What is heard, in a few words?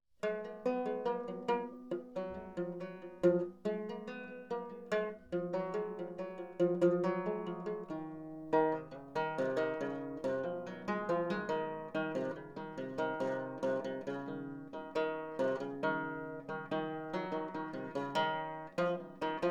fretless banjo; Koromanti3